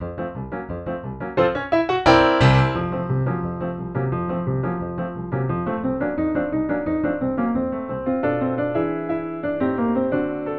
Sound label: melody, F major